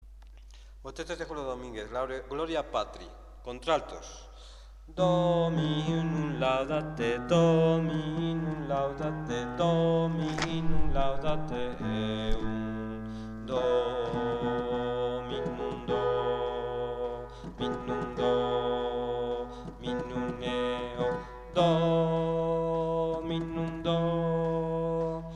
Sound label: gloria alt; 3 motetes